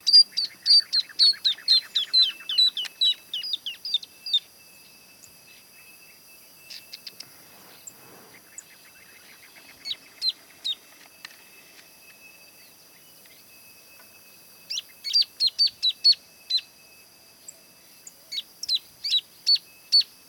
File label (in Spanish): espinero pecho manchado voz; aves